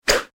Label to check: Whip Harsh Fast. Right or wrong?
Right